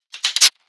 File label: Futuristic gun firing mode switch